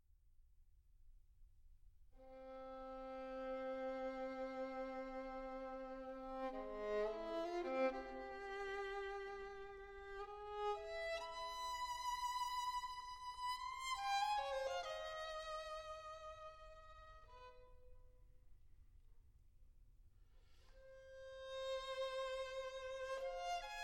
adagio poco rubato, quintets; Daniel Strong Godfrey; Cassatt String Quartet

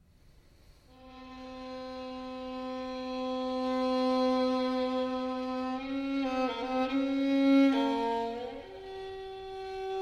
sonata no. 2 in f minor adagio allegro moderato

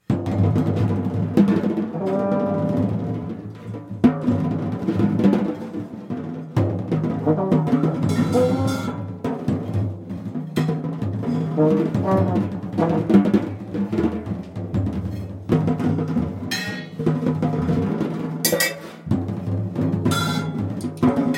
tap rattle and blow

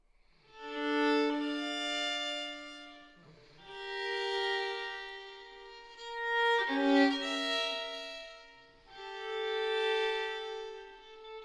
violin partita in D minor, sarabanda; violin partita no 2; bwv 1004

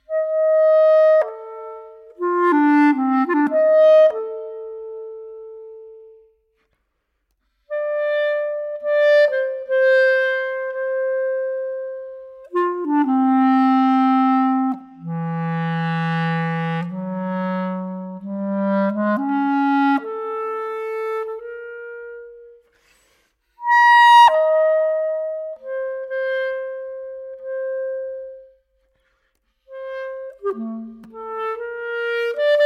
for clarinet solo

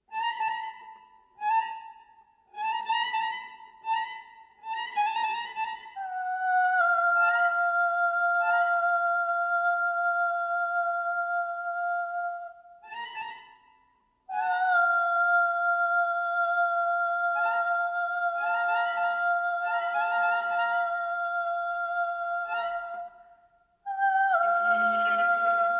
cricket viol

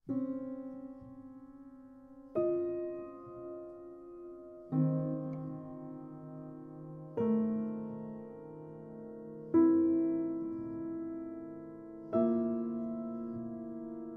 solo for piano dyads

